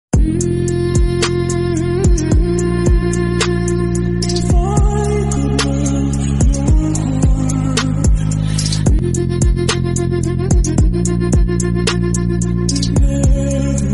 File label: Slow Remix flute